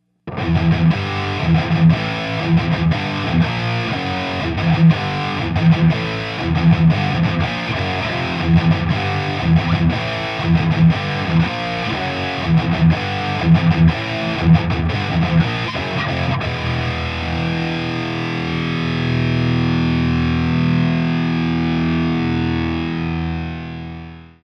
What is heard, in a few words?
vh4 stereo